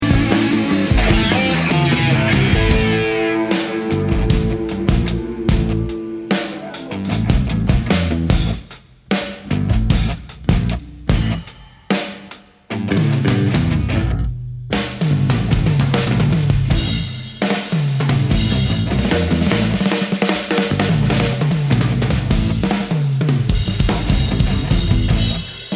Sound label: Solo